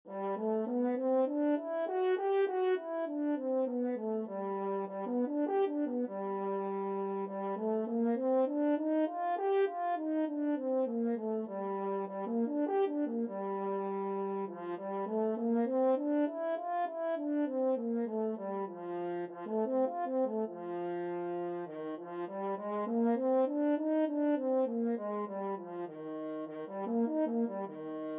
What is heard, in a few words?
horn scales, french horn; grade2